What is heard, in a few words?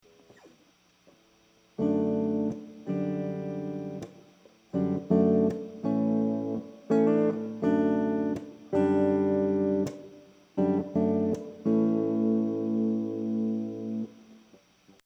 electric guitar; Movo UM300 cardioid